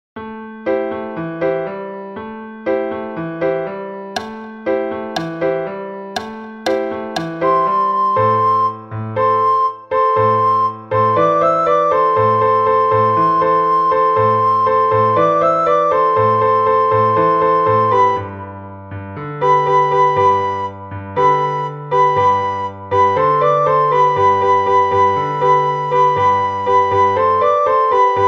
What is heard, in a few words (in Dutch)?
sopraan; Flierefluiter 5